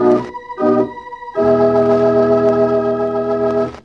organ presents

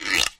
guiro

